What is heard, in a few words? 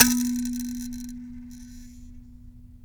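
RATTLE KALIMBA B 3; Heart of Africa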